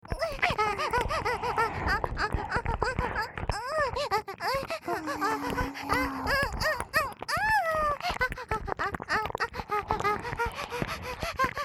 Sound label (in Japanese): 両耳舐め+激しい演技喘ぎ; 小春